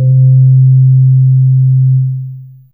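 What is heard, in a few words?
Vibraphone Up C2, Fairlight CMI Mallets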